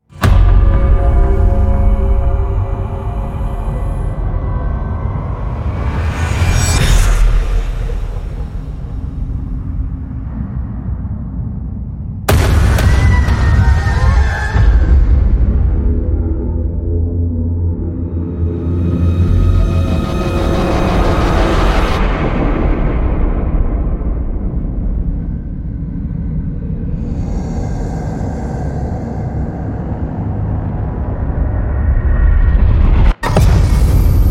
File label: Horror Sound Effects Cinematic Horror; BOOM Library